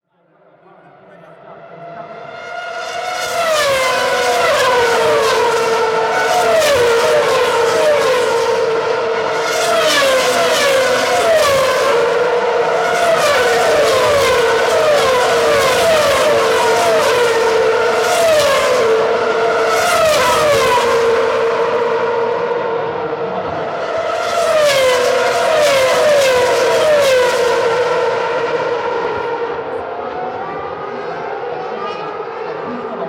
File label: Shanghai Formula 1 Grand Prix